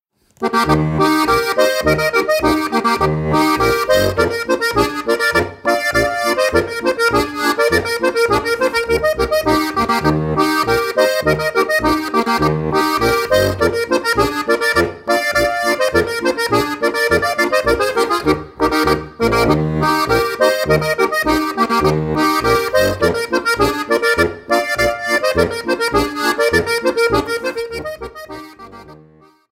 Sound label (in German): Boarischer